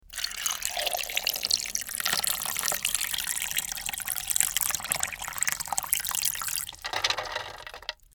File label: ice water